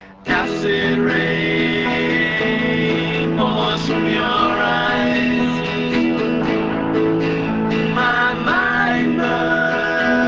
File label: accoustic